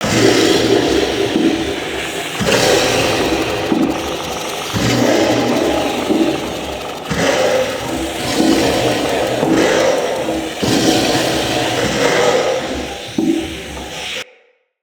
Ruins Machine Loop; Props

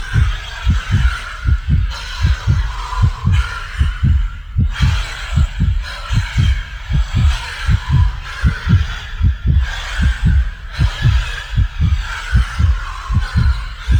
Struggle Prompt Loop; Warframe; The New War

